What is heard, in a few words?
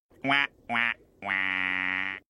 wha wah wah